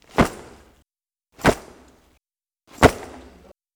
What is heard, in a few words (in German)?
Hinfallen